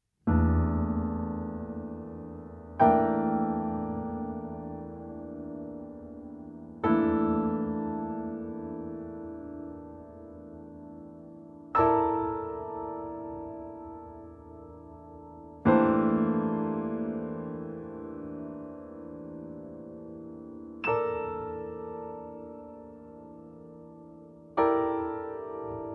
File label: Emin7b5